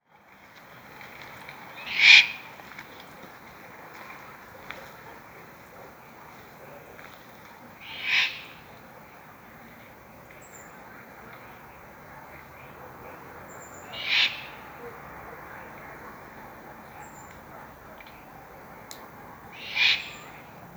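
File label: Magellanic horned owl bubo magellanicus, night birds; nochnyh ptits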